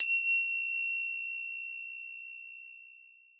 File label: Perc Triangle Long; Bruno Bosselli